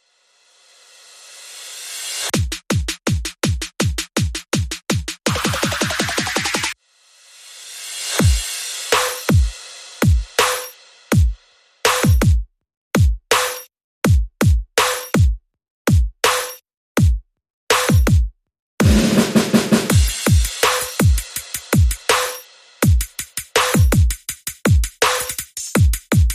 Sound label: Drums Only